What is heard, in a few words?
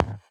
One Shot, Hits